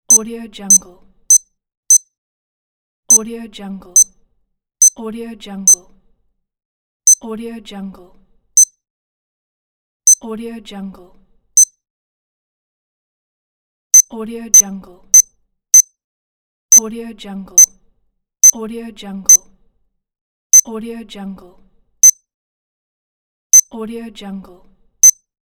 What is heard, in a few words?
timer